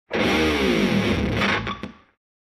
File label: Rock guitar pitch going down